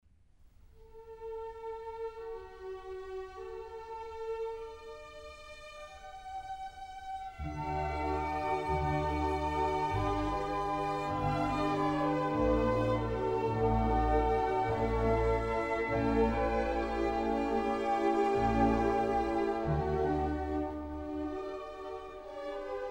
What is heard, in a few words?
Violin Concerto in G major; Mozart Violin Concertos 3 & 5